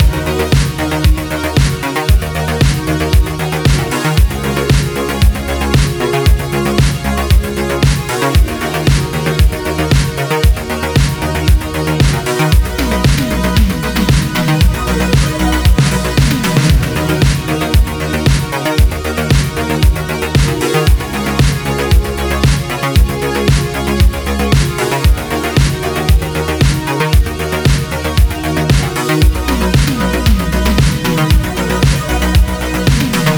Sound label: Music Boss Defence Loopable 115; Wise The Wise Boss Defence 2